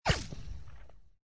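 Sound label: shoot Bullet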